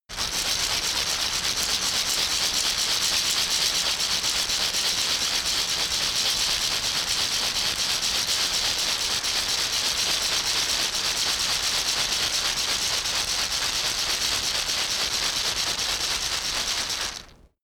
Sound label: shaker wood rough rattle slow soft